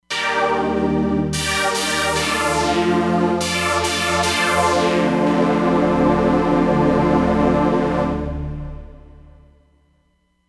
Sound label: Moon Synth, Roland Fantom XR